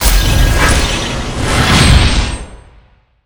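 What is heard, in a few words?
Boss Ancient Guardian red spawn, monster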